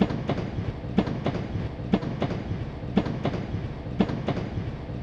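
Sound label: train loop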